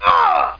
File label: Aah; ARCADE; NOS